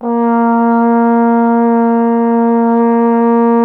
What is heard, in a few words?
Trombone A#; Miroslav Vitous; Solo Instruments VOL-1